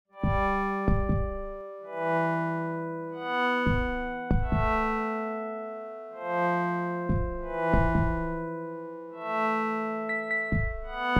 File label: Spooky, Soundtrack; Audio